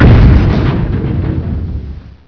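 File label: metal fall; Hexen II; golem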